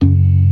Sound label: CLASSIC C3, Synth and Organ